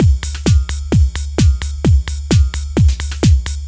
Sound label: loop